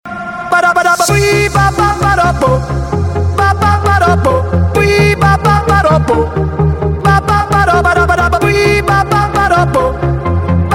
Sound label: win